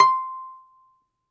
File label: DOMRA, Prima Domra, Plucked Strings; E-MU Producer Series Vol. 7 – Old World Instruments